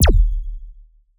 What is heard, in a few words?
Shot Punch